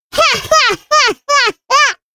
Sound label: Classic Miss Sound; Jolly Battle; Android